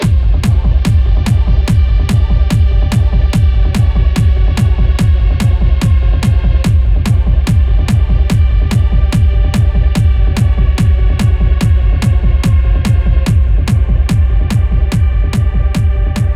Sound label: Tribal Dynamics